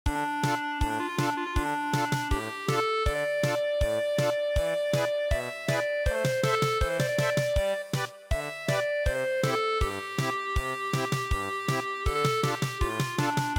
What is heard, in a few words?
German Polka; Xia sichuan